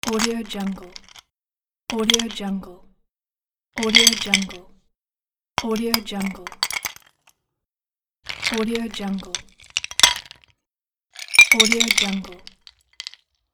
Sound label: put an ice cube in a bowl; teldrassil